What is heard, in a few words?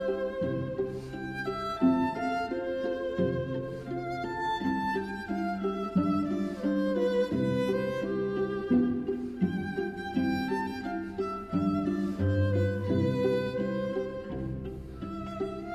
String Quartet in F major, Serenade Quartet; Opus 3 no 5